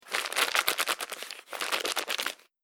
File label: Crunching Plastic Wrapper Noise; Crinkling Sound Effects; Foley